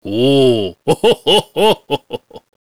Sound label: santa Big Win End